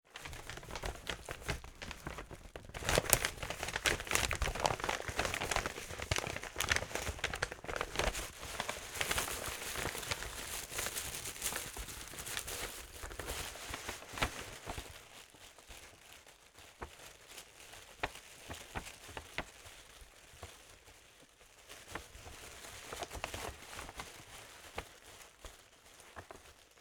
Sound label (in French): Papier froissé